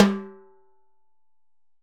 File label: Timbales High Open Hard; Drums